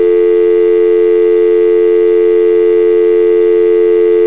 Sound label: dialtone; Motorola Q9c